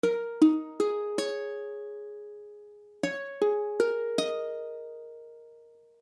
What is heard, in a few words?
Eb7sus4, ukulele; Eb akordy (=D# akordy)